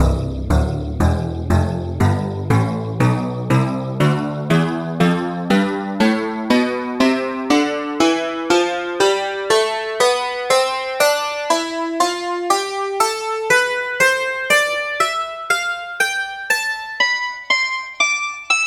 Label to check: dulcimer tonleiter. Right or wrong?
Right